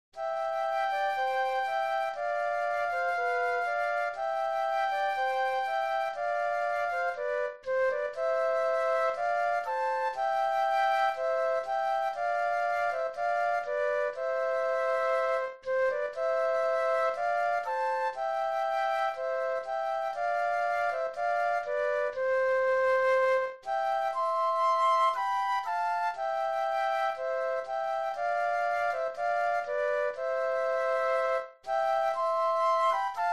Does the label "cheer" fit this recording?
No